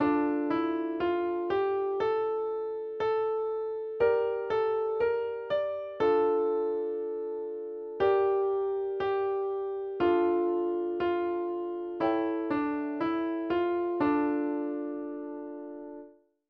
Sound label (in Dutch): tussenspel; Nederland Zingt